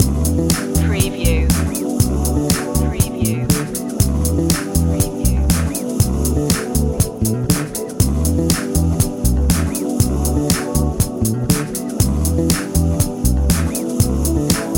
big city groove loop

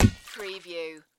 Splash Beach Ball

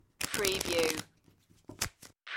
Card Shuffle

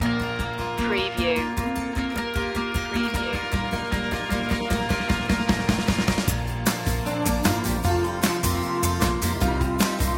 springing indie

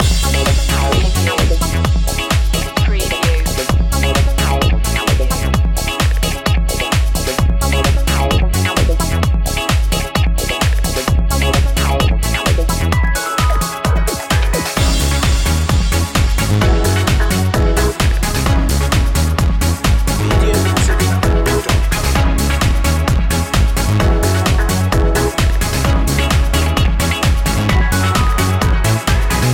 pump steady groove loop

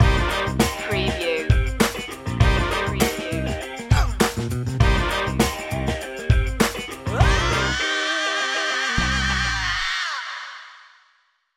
funkin yell stinger

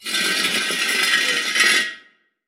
Wheel Drag Long

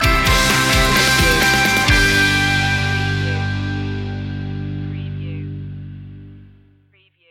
smile stinger

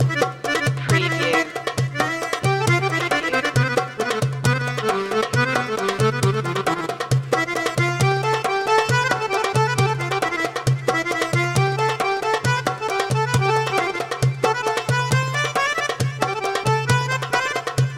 dance party oriental loop

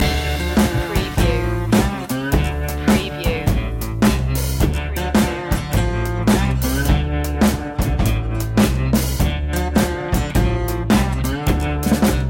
blues loop

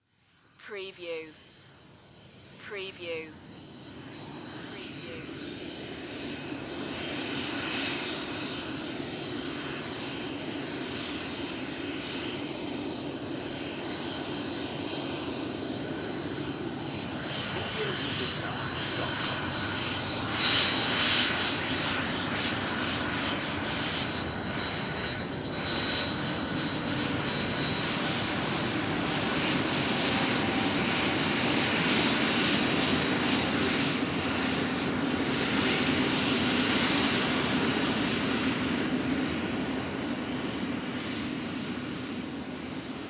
Airplane Jet Taxi By

